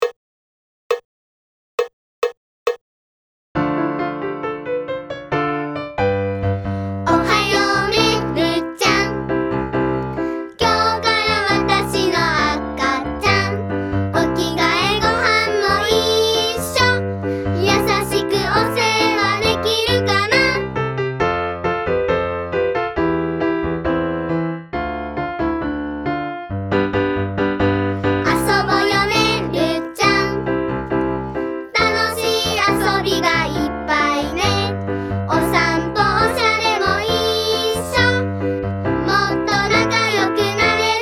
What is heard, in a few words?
piano; mellchansong